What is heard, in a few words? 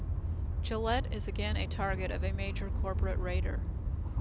car